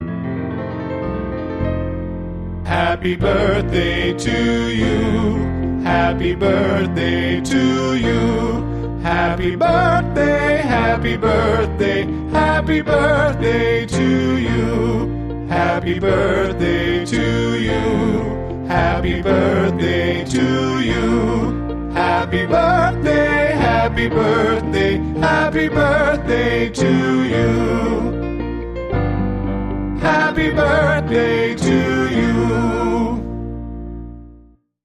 Male Group Vocal Traditional Happy Birthday

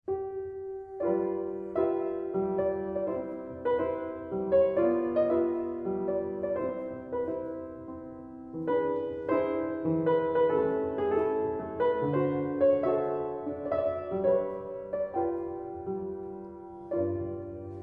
Mazurka in C major

